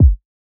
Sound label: Kick